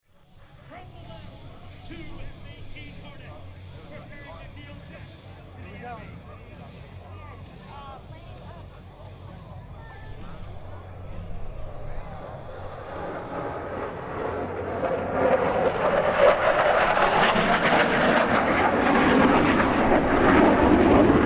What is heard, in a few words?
Two F-18 runs